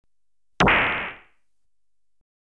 Stun Gun (Single Shot), Electronically Generated Sounds; BBC Sound Effects Library